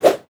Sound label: double jump; gameplay